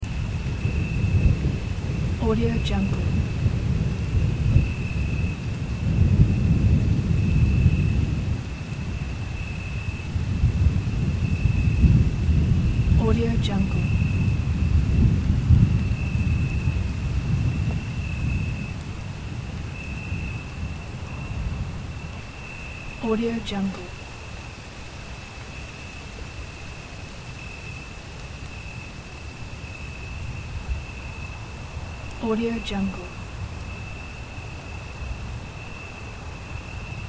nighttime ambience light rain with thunder in background